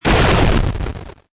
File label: explosion; Flying Metamorphosis Queen of SM Bar Fuate Co; android